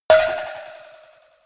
cowbell move hard

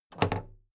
big plastic case handle bounce heavy

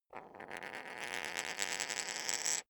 poker chip spin short

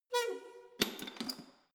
letterbox metal push close lock

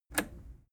plastic button scratch release disable

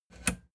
plastic button latch push enable

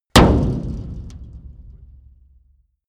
metal impact tank hard

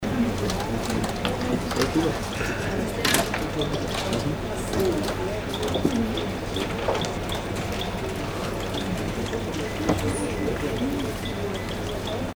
office atmo

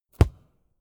football kick

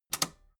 metal button robust spring release disable

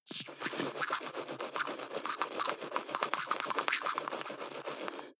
teeth brush dry closed front

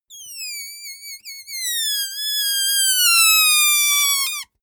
balloon air release squeak clean

